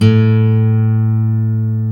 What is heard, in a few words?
GUI AC., Ac. Guitar; Sound & Vision; Gigapack I CD 2; GUI ACOUSTIC 1